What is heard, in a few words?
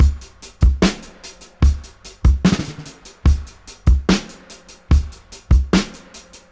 73, Dub, DRUM LOOPS 073 BPM; Drumdrops In Dub VOL-1